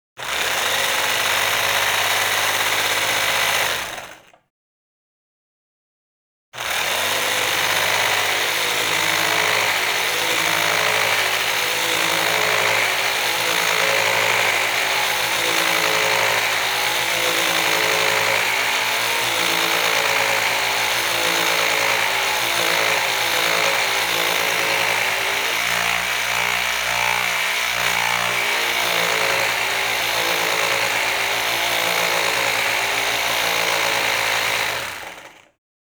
sander. electric vibrating sander on and off. construction